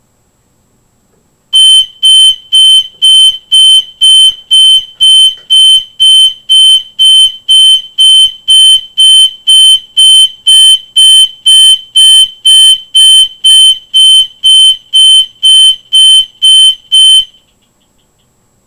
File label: Simplex March Time